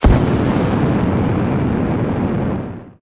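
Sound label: space movement jetpack idle; smite; Anhur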